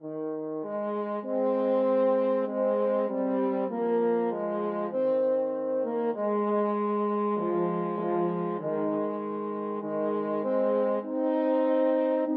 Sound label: french horn duet